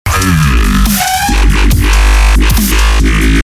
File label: scary wubs